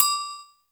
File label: agogo; Roland R-70; Perc